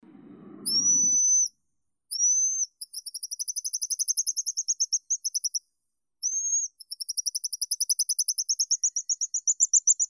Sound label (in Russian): chistik tihookeanskii cepphus columba